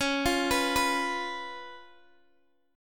Db 7th Db down Guitar DADGAD

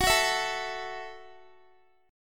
F sharp Minor 7th F sharp, Guitar; strummed; x,21,18,21,21,x

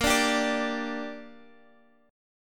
G Minor A sharp, chord

